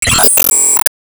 Team Skill Full Max; Team Skill Max

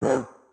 Chop Wind Up; Caffiend Caelan

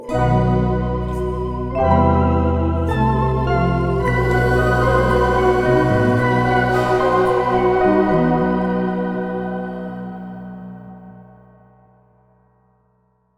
Mystic Cut Scene; GRINDSTONE MysticCutScene

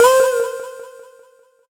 Synth

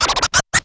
Click Refresh Deals Shop; subway surfers city sound effects; Android, iOS

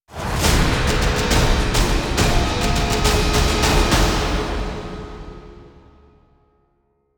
Stinger Fill

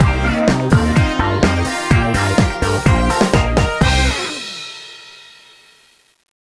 Disco Fin; Imagine Modern Dancer; Nintendo DS

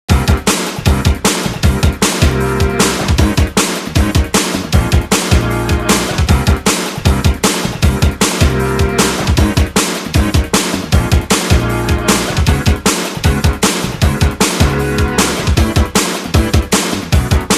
4th Temple Game (Battle 4); RPG Maker 2003 Presents Legends of the Hidden Temple; Android

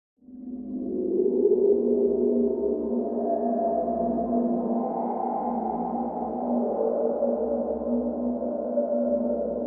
Spooky Music

